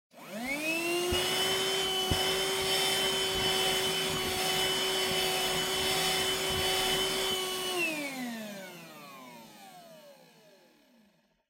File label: Vacuum Cleaner Vacuum Cloth; 掃除機; バキューム 布